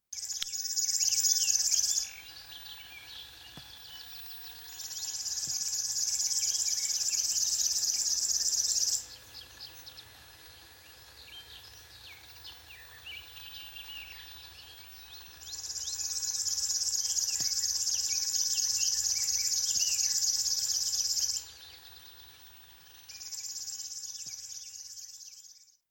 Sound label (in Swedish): flodsångare; fågelljud